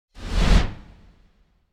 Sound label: Whoosh By Classic Deep And Fast Passing; Hollywood Edge; sound designer's toolkit v2